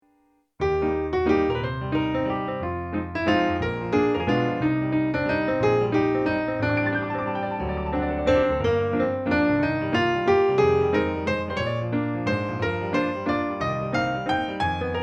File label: real piano